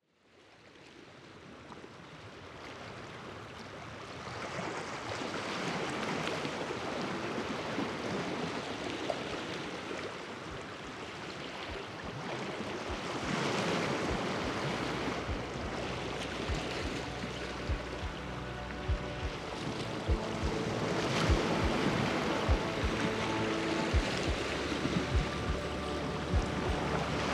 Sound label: Deep Rest Waves Sleep